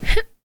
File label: Jump; MiSide